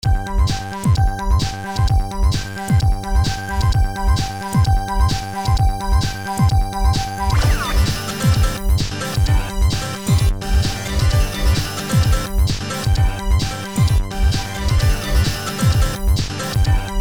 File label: Loop